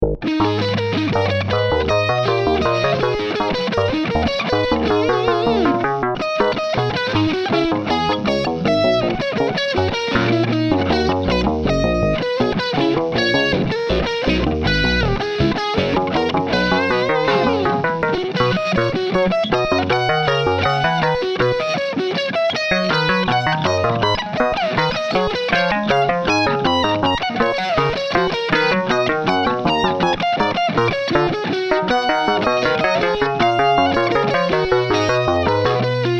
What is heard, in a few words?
invention guitar